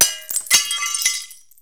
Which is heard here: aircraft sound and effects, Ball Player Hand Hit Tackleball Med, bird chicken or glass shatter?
glass shatter